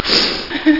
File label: sniff